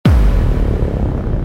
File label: bang Large